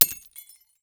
Crashes Light Bulb Smash; AdobeCC

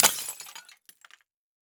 Crash Ceramic Bowl Breaking On Concrete; Crashes

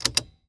Tape Player In Full Size Van Button Pressed Off; transportation

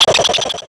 Flash Digital; Multimedia Internet CD-Rom Flash

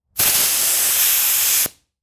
Industry Tool Air Compressor Blow Air

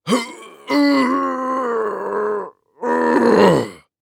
Human Male Strain Grunting Release

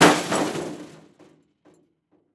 Crash Metal With Rocking; crashes; AdobeCC

